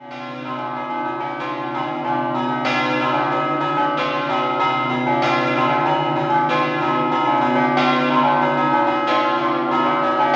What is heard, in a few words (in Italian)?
Scampanottata; Basiliano; campane